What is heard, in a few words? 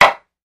Toilet: Seat Up / Down.